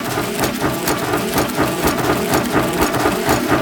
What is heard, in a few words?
factory sound; rhythm and cloth